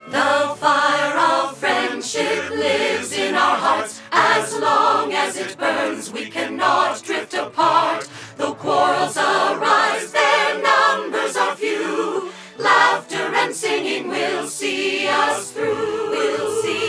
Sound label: The Heart Carol (vocals); My Little Pony OST Repository; Season 2; Bonus Music